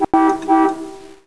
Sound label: Horn; TrueCrimeStreetsOfLA; XBOX